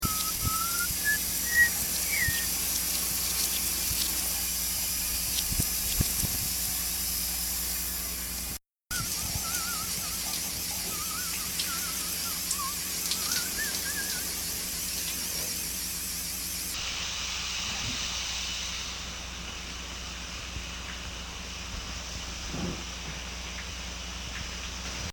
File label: shower